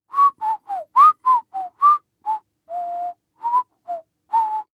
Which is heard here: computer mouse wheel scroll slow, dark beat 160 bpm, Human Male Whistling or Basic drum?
Human Male Whistling